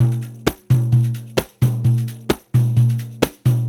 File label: Tar, World Groove; Ambient Grooves